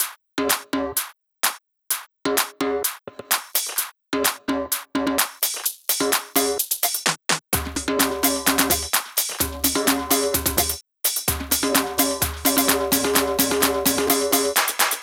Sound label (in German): Drum Loop 128BPM FULL ohne BD